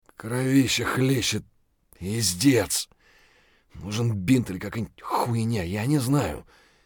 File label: bear low hp; eft